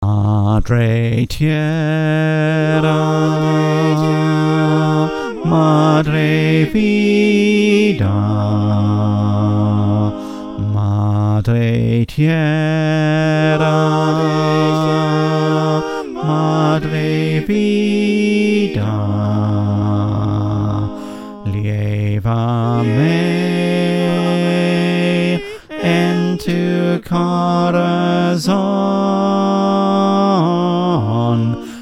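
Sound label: bass, choir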